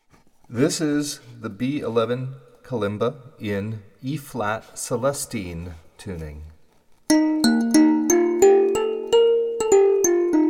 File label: Eb Celestine, kalimba